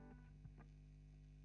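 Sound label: GTR Royer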